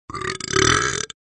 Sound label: burp; assassin m4a1